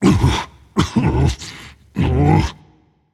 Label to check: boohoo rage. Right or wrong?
Right